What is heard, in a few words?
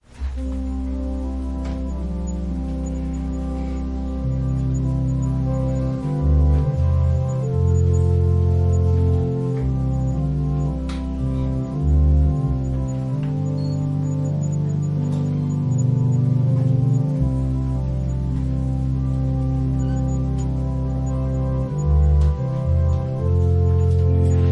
Organ prelude; Sunday Evening; The baptism of the Holy Spirit